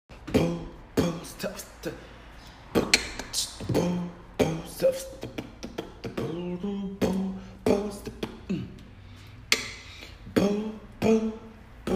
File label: Beatbox War